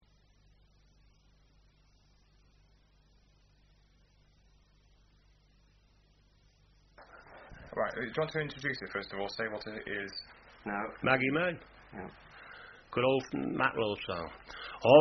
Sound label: Singer, Male